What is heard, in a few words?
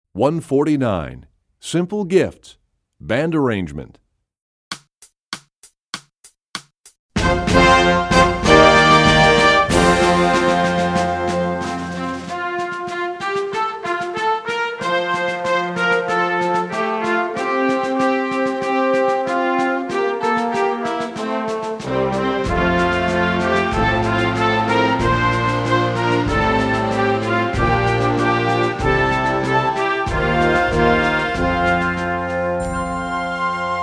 Simple Gifts, Percussion; EE2000 Book2